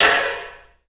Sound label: CLUB SN VRB, 3D Drums; 3D Sound